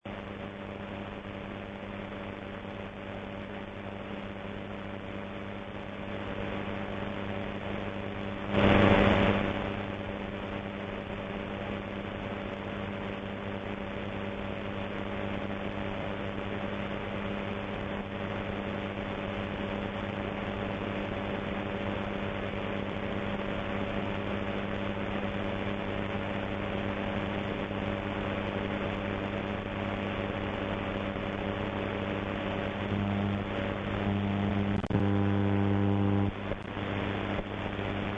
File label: 1550 noise